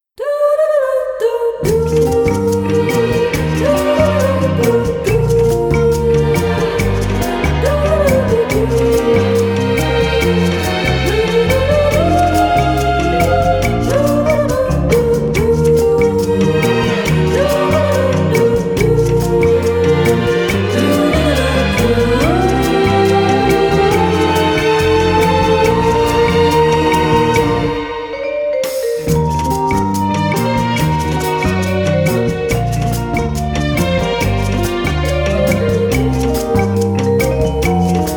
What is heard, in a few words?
SENSUAL RHUMBA